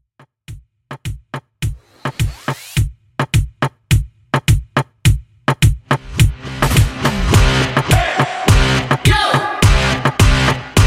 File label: Mixshow Reggaeton 105Bpm